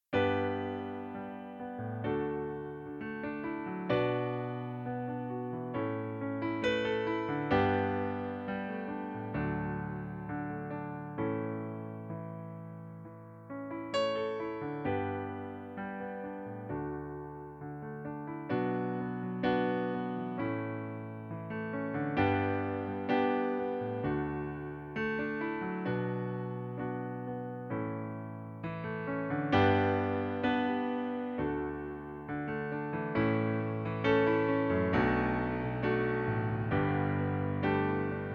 Pianoplayback D-dur

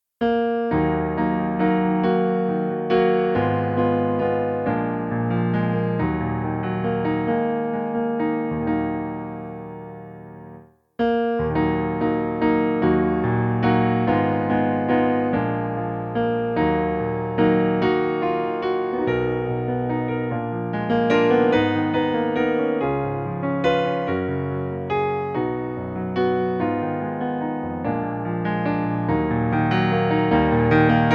Pianoplayback e-moll

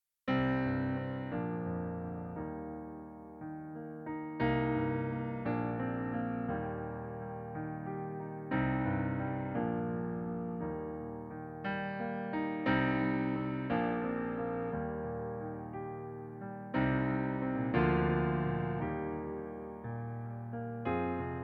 Playback Eb-Dur

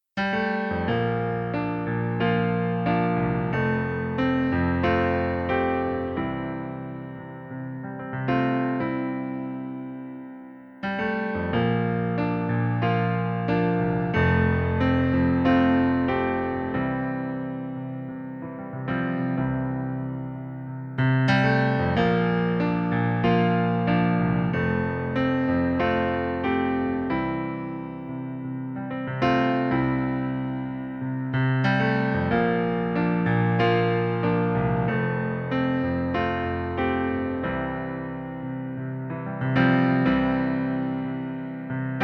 Pianoplayback C-Dur

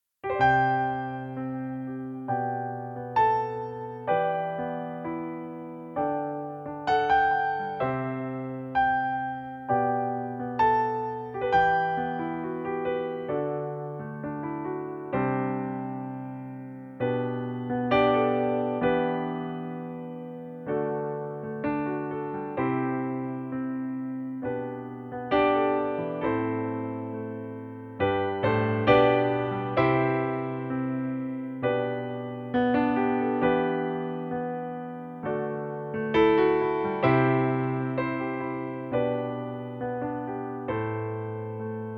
Pianoplayback C-Dur

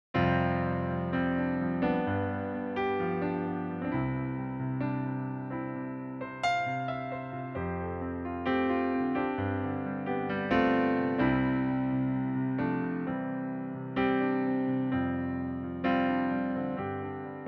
Pianoplayback C-Dur

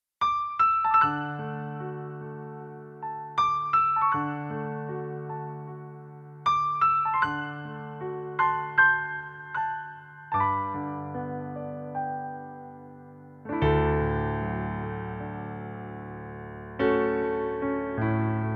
Pianoplayback D-Dur

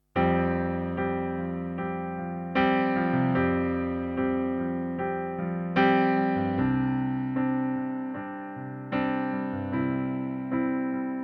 Pianoplayback C-dur

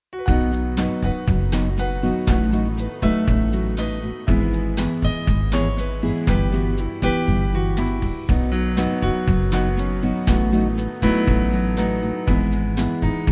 BossaNova vollinstrumentiertes Playback H-Dur

